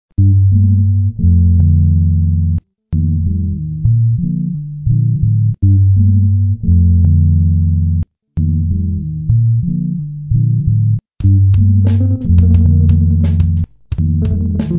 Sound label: bgm; Truck